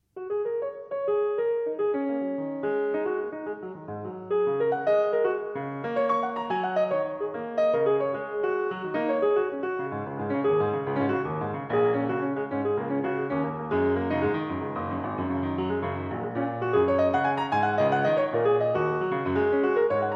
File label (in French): improvisation